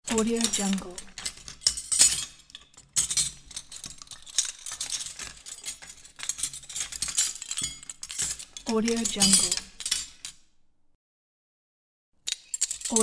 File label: cutlery